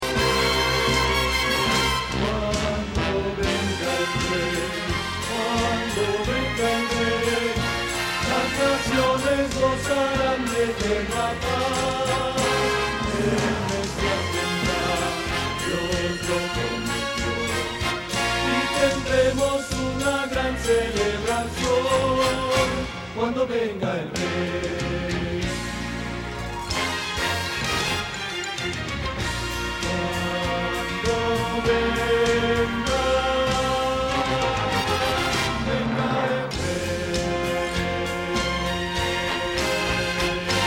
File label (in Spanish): Bajo